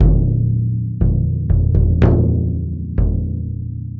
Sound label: DRM; Best Service ProSamples vol.52 - World Instruments 2; AKAI; MIX DRM LOOP